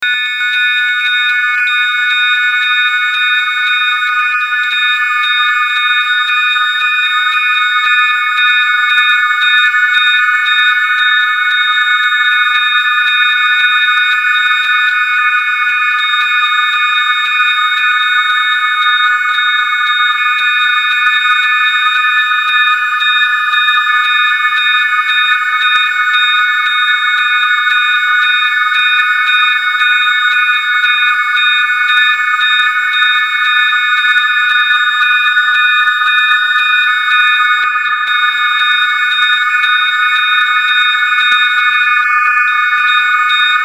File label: Sinewave